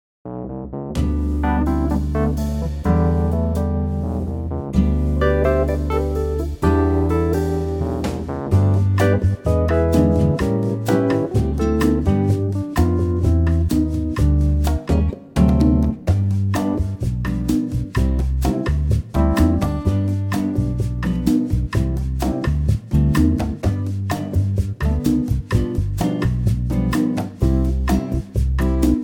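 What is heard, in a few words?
bossa G, backing tracks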